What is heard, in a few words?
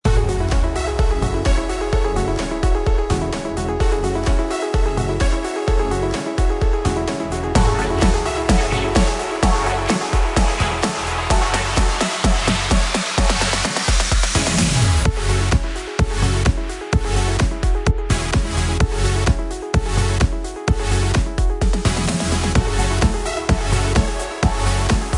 128 Gm dance; Claros: 128 BPM; Música para eventos